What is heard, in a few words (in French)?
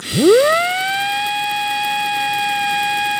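sifflet loop; release sample possible avec nnxt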